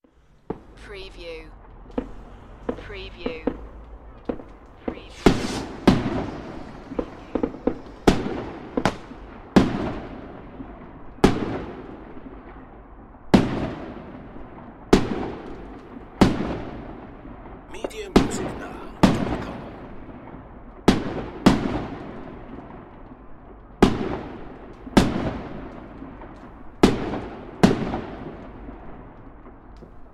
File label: Fireworks Rocket; beanstalk audio